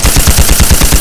Assault Rifle Shot Loop; SFX Kit; Weapons

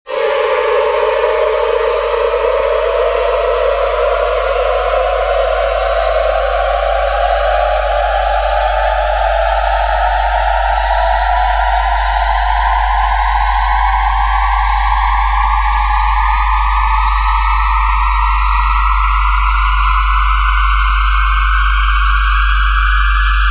Pullstring Jet; Tention Makers; RoosterFX

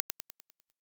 imp, Impulse Response

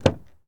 Truck Dakota Door Open; Trucks

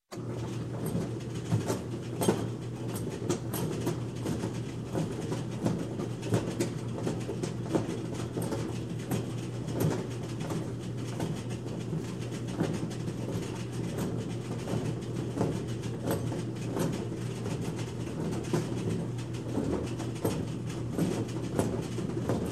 Hay Conveyor Start, Run, Stop, Industry, Farm; Sound Ideas 2000 Series

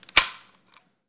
Gun Handling Weapon, Weapons Foley; SFX Kit

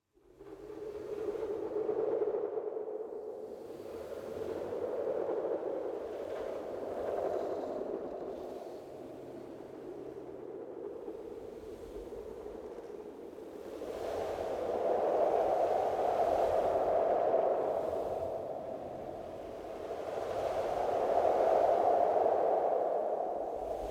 Wind Whooshes Whistling Eerie Ambience; Hollywood Edge; sound designer's toolkit v2

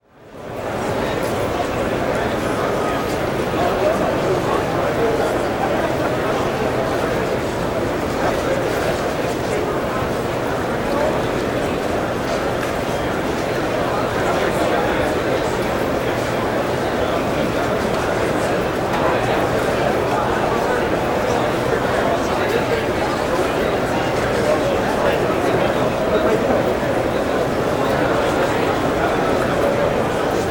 Convention Large Exhibition Hall, Walla; Sound Ideas; Walla Series

